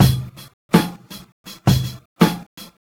Drum loops, Boombap

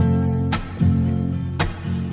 guitar

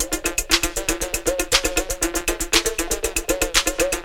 dub to scratch, Drum loops

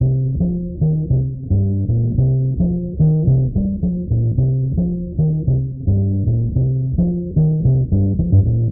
bass, Loops Phonky

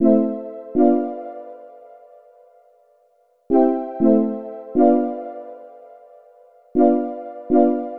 mad lead; Loops; Electronic

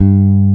garbled, Bass; Notes

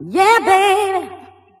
yeah baby sung

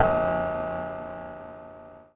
dung, Bells

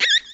squeak, percussion; Misc Percussion

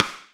Rimshot, Snares

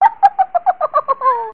laugh girl

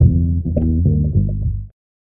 bass, Loops, Phonky

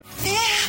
"yeah" orgasm

